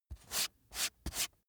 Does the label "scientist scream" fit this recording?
No